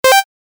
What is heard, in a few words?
cursor